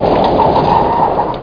SPIN

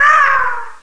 Bird die; CD Action; soultrap

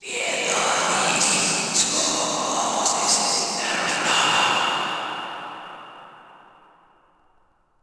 undead; DAoC

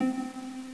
Harp; Castles - Siege and Conquest

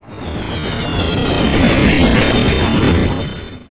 impact razor; Earth and Beyond; EA Games

